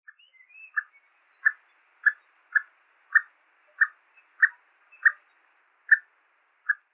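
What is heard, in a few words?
Chinese Bamboo Partridge; koe; kitamoto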